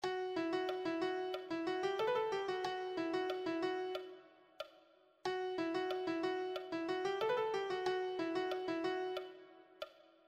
melody

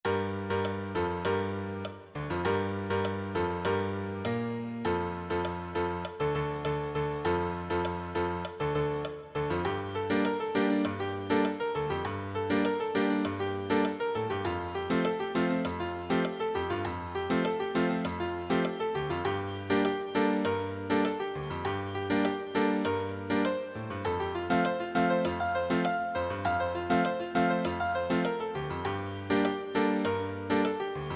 solo, piano

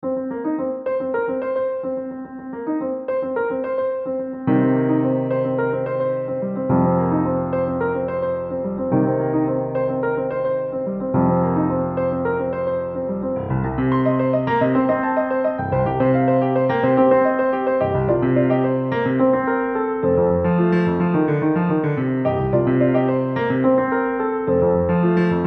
adv, piano; Ananthapuram 1980

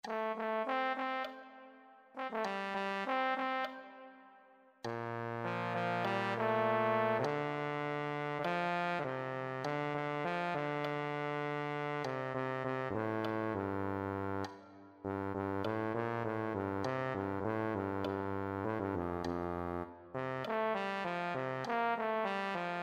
euphonium

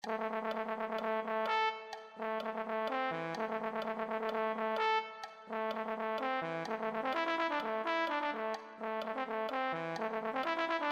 euphonium

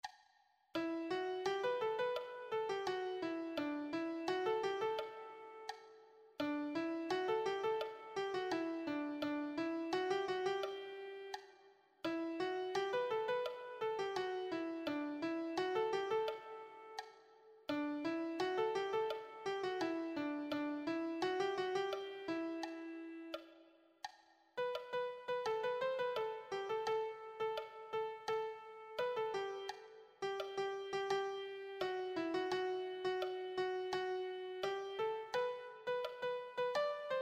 reprise melody, piano

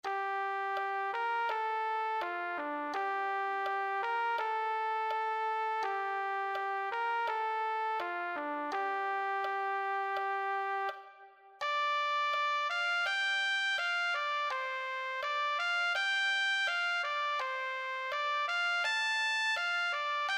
trumpet

